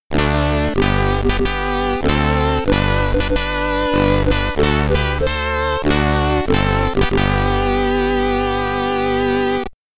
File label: Eastern Europe O Tannenbaum (SID Stereo)